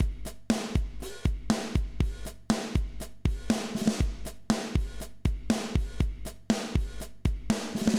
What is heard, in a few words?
emscripten beat right; webaudio